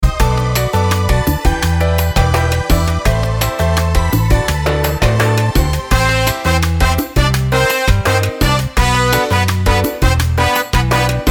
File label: salsa